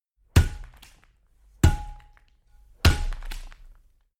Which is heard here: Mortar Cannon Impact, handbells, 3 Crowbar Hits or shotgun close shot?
3 Crowbar Hits